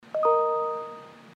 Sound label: ding